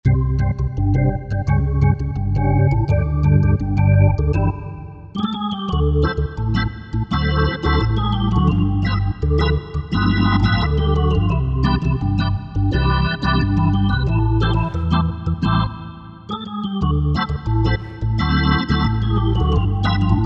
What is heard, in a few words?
Jazzy Organ